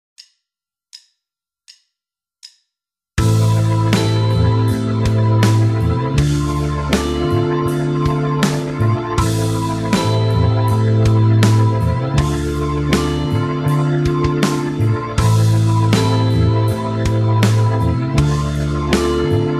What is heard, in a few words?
Rockschool backing; Guitar Techniques 2018 Issue 278 February